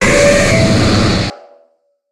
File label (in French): Cri Méga